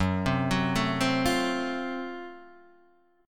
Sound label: Gb Minor Major 7th Flat 5th, Guitar, Half Step Down, chord